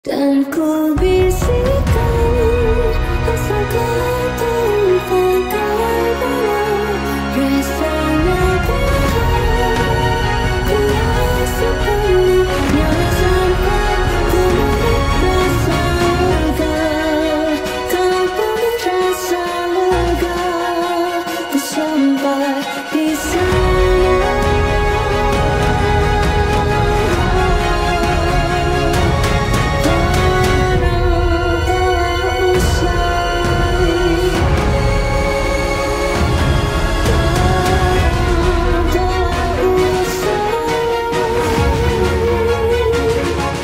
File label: Epic Cinematic Orchestra